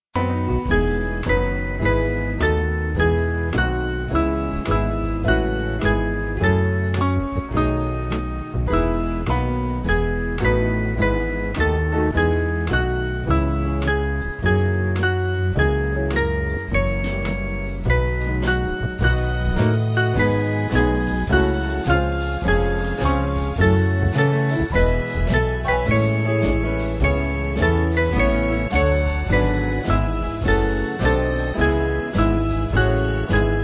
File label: Band; To Thee O Lord